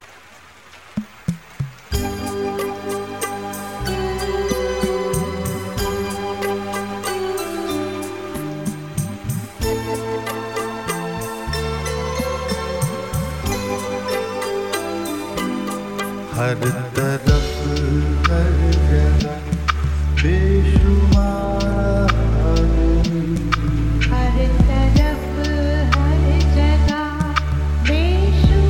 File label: lofi flip